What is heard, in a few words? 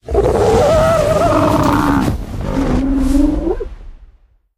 Tropeognathus half-loop sound